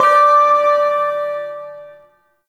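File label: Ersatz Shawzin Octave Two D; Music; Warframe; Duviri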